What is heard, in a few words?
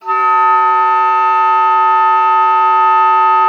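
oboe d'amore; Xsample VOL-7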